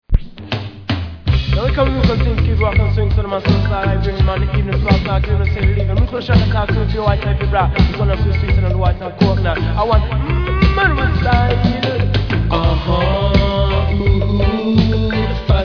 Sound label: beat